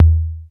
LOW TOM, one shot drums; App Inventor Media

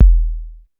KICK, one shot drums; App Inventor Media

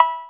COWBELL, one shot drums; App Inventor Media

